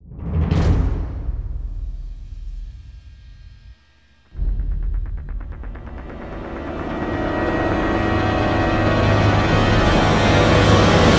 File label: DRUM ROLL TO STRING BUILD; STNG IACON INVASION; transformers war for cybertron